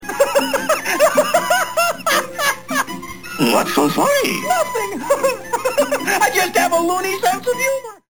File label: funny